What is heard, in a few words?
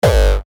kick; misskey; noizenecio